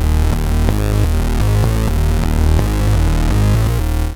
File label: PULSRUN 126, BASSLINES